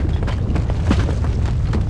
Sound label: lava; Tony Hawk's Project 8; XBOX